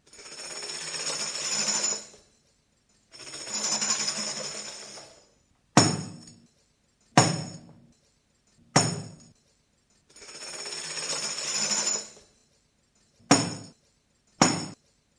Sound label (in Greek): zilia sounds